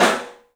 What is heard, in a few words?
STEEL, Drums, ROOM SINGLE; 3D Sound